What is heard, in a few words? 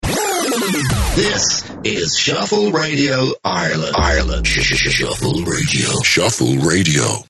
Stutter Jingle; Shuffle Radio; station identity jingles